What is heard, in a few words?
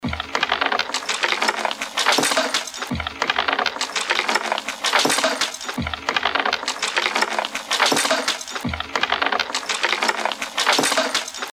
strange loop